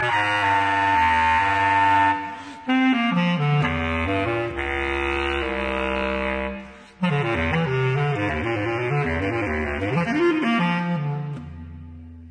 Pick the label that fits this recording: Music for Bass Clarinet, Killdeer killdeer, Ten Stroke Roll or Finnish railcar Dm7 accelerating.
Music for Bass Clarinet